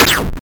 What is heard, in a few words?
Gun Shot 8 Bit